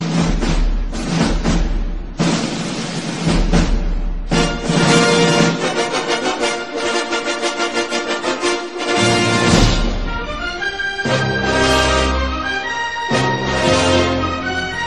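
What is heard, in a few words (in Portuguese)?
abertura clássica; 20th century fox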